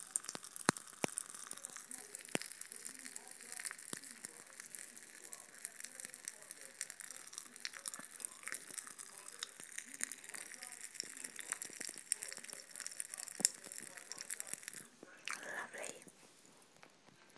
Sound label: fizz bang whizz